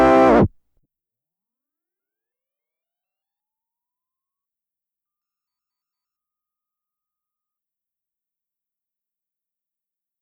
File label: farfisa